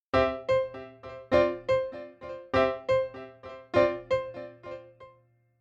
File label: delay